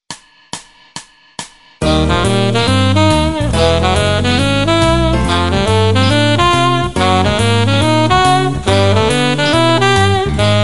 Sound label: penta altosax